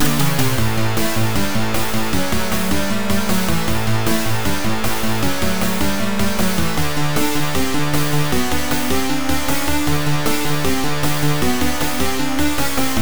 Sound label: Rock Loop; General Purpose 14